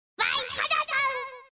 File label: notification; super bomberman 5